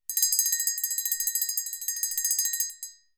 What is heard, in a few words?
bell small ring